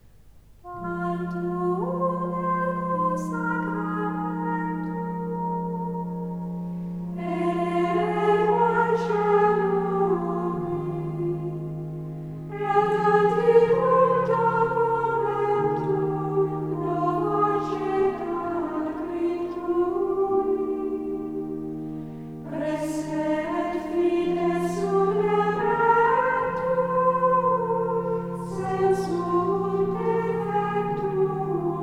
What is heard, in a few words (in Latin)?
hymn tantum ergo, gregorian chant